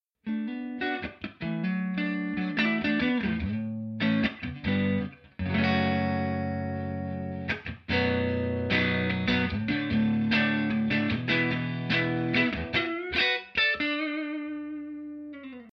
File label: clean